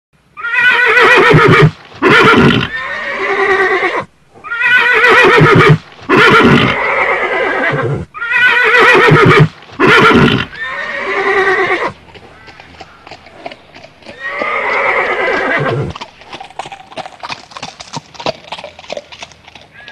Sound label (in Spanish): sonido de caballo